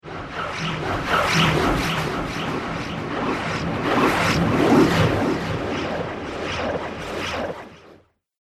Alien Slurpy Atmospherics

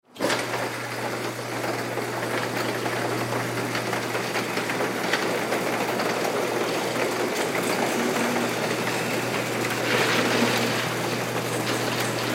Semi Truck Garage Door Open; Trucks; Vehicles; Industry

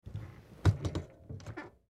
Recliner Chair Recline; Recliner Chair Sit Up; Household; Blastwave

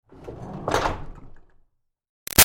Door Barn Rolling Metal Clang Light Short; Barn Doors

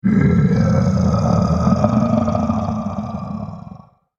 Gurgle Breath Monster; Scary Breathing Sound Effects; fantasy sound effect

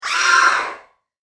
Horror Scream; Screaming Sound Effects

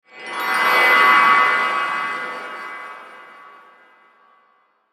WHOOSH TINKLE, Whoosh Tinkle Shimmer Swell; Blastwave

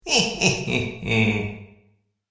Heh Heh Heh Laugh With Reverb, Evil Laugh; Evil Laugh Sound Effects

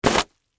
Splat Wet Towel, Drop; Texture; BlastWave

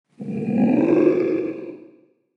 Angry Dragon Monster; Fantasy

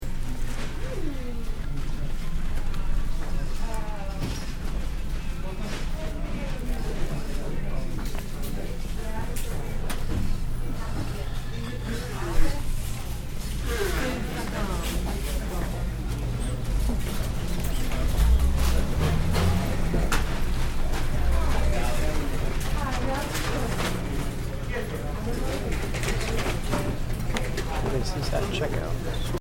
Grocery Store Interior Light Crowd Near Checkouts; Stores And Mall Sound Effects

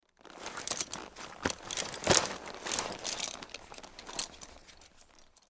Horse Saddle Move, Horse Saddle Movement; Animal; Blastwave

